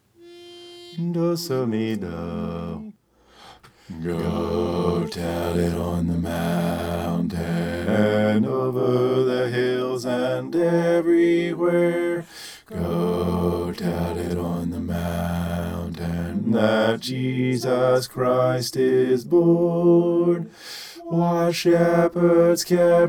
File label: go tell it bass